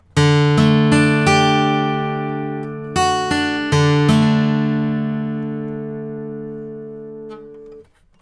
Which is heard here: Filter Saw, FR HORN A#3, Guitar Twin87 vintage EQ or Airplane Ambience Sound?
Guitar Twin87 vintage EQ